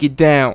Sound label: Dry Vocal